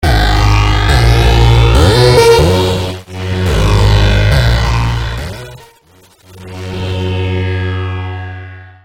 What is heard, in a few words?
New Electro 3D